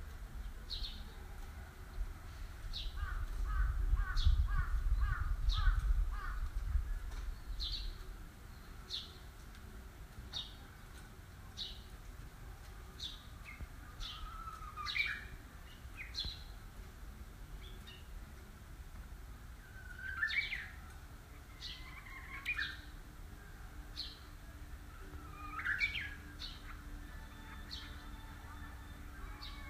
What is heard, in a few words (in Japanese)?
ホトトギス